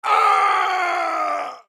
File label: Pain Death; Black Adam